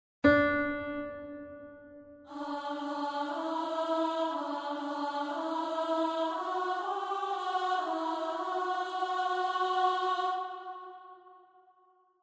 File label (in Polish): Ameen na 3 glosy Glos 2